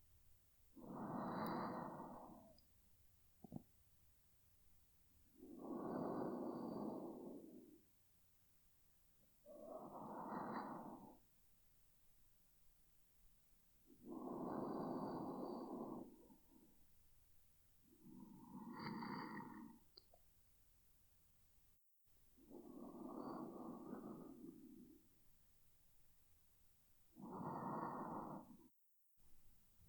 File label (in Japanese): 寝息ボイス